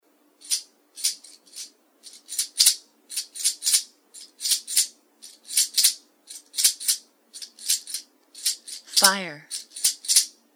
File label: flute haiku; ire